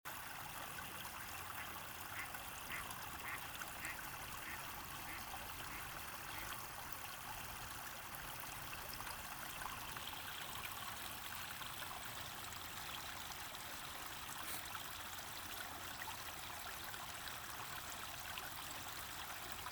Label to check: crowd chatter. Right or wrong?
Wrong